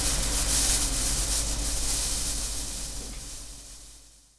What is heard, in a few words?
fuze; firearms